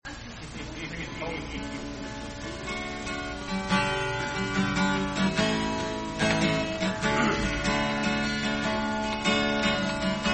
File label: Polish Hymn; Polish Missionaries